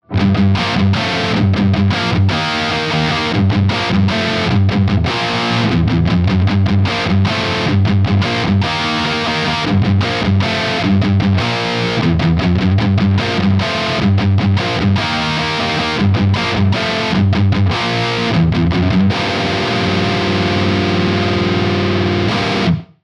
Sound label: Mesa Triple Vintage AMP; machine modeling